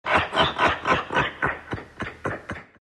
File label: dragon laugh, dragon knight; dota